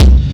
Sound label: kick